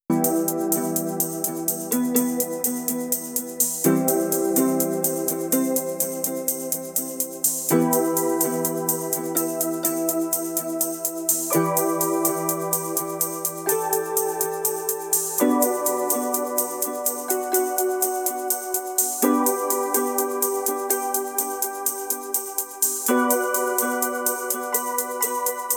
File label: minimal